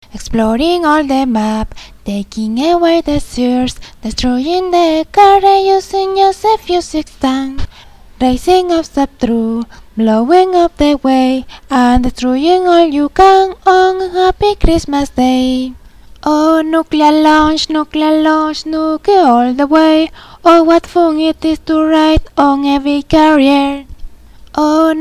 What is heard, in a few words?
nuclear bells